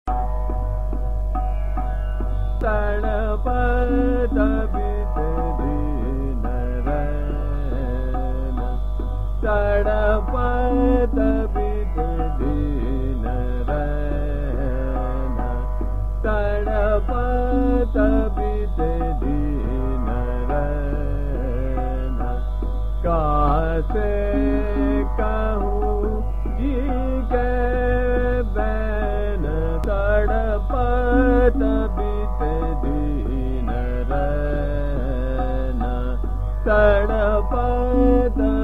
Chota Khyal - Trital, Parameshwari; Tarapat Beete Din Rain

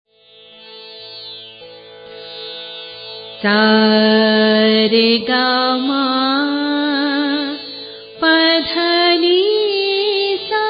bhairavi, raga

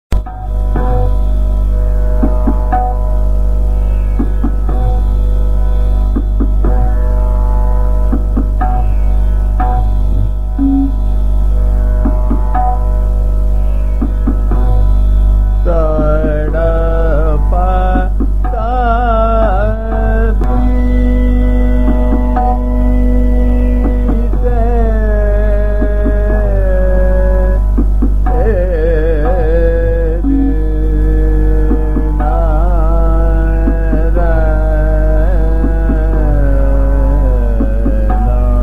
Sadra - Jhaptal Madhya lay, Bheem; Tadpat Beete Din Raina

